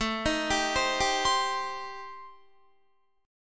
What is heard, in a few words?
A-Minor 7th Flat 5th, Guitar, chord; Am7b5; arpeggiated